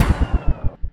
Assault Rifle Shot Loop End; Weapons

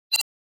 Button Loading Beep - Double; Hollywood Edge; sound designer's toolkit v2

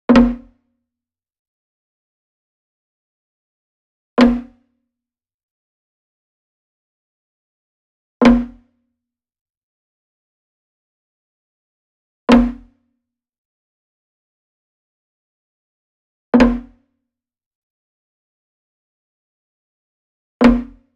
Wood Percussion Wood Drum Floor Flam Beater Near; boom library; Cinematic Strikes Construction Kit

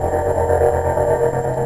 Scifi Hover Sentry Loop; SFX Kit; Sci-Fi Loops

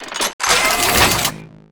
Gun Reload Scifi, Weapons Foley; SFX Kit

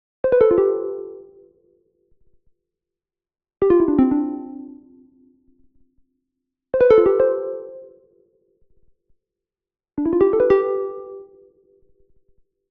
Arcade Jingle Neutral

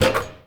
Street Ball Glass Basket; SFX Kit; Street Basketball

